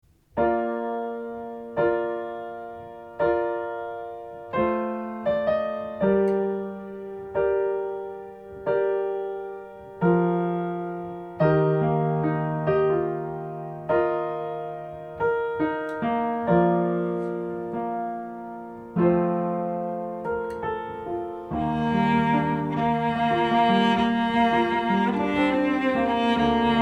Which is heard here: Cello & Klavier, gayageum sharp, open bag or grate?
Cello & Klavier